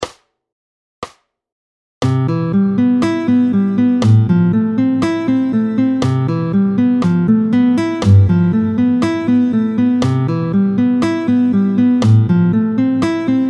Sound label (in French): La houle Vite 2 2 tempo 120; 16-03 La houle. Vite 2/2 tempo 120; Exercice chapitre 16 page 68